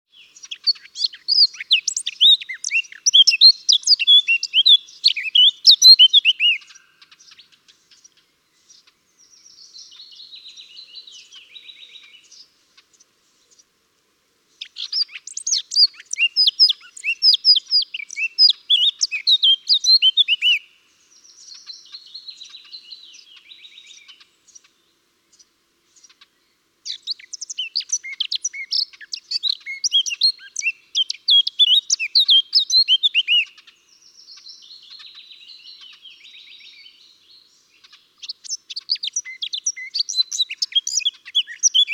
Sylvia atricapilla; SETE

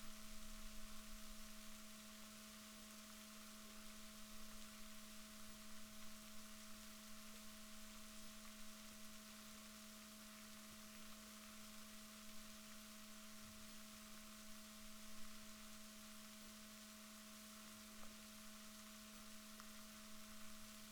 rorquals positif, Balaenoptera Physalus Chile

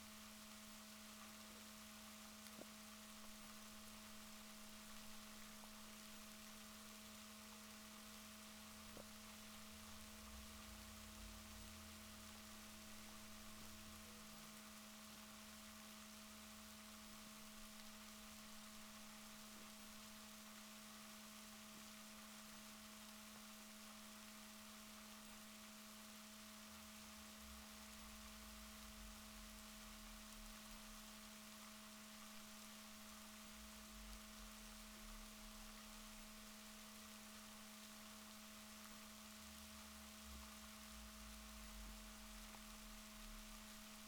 rorquals positif, Balaenoptera Physalus Chile

